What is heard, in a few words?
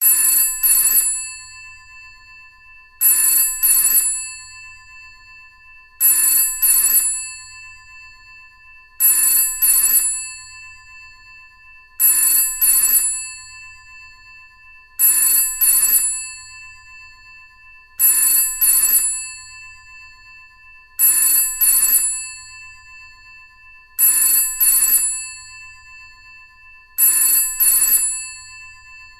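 British Phone Ring 17 Times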